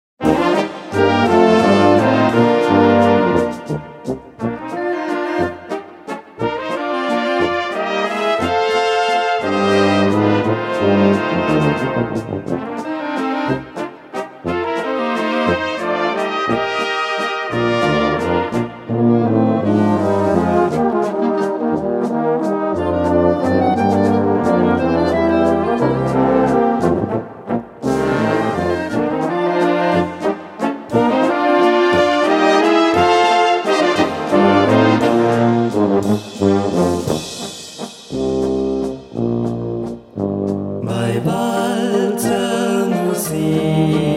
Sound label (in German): Walzermusik